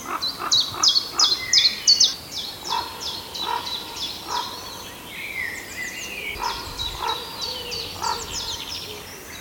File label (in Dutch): raaf roep